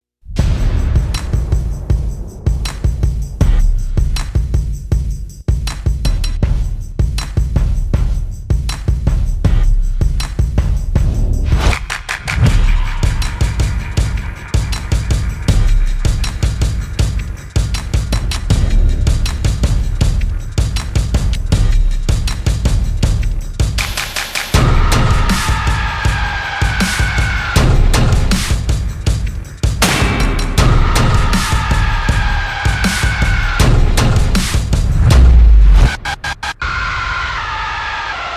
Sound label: Dead Walking (Percussion Only); Ninja Tracks